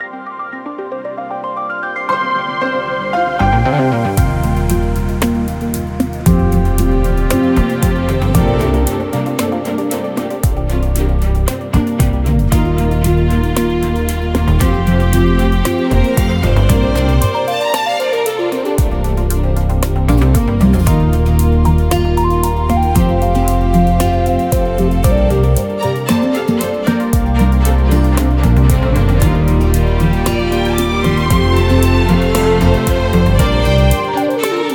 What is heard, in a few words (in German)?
Wetter